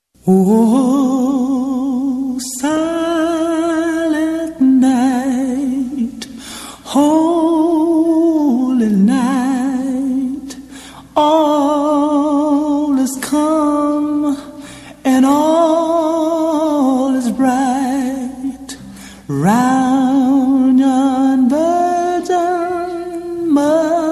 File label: Silent Night acapella